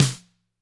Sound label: GRCH LO, GRETSCH SNR; ILIO; Double Platinum Drums 1